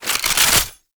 Bayonet Equip Melee; Warframe; Tenno

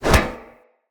Shield Catch; Weapons; Swords; Dendrit Sword And Board

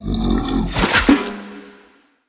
Camel Idle Spit; Zenith Camel Vendor; Vocalizations

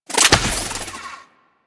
Shotgun Glaive Return Mech; Warframe; Tenno; Alchemist

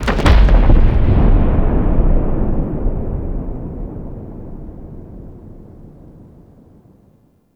Distant Space Explosion; Wraith Quest End

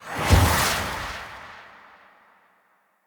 Hallow Spirit Energy Cast; Halloween

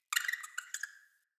Messenger Rat Chatter H; Ambient; Vocals; Verminia; Animals

